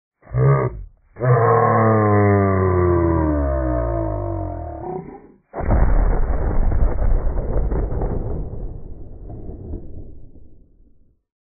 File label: kaboom; vapesquared